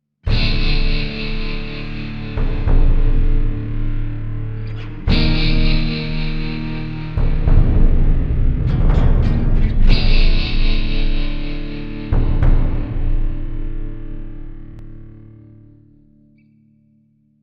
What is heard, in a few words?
Stinger Mexican